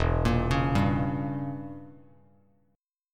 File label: E Diminished 7th E, Bass Standard